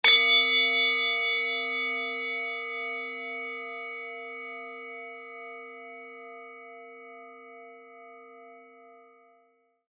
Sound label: japan rin bowl crash, C